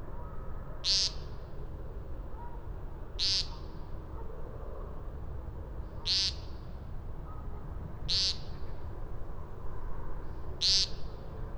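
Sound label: amwo peent, American Woodcock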